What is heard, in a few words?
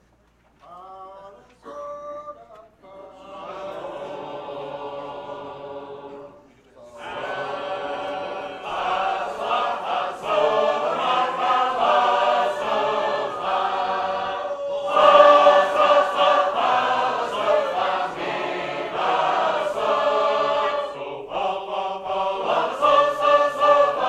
Gospel Trumpet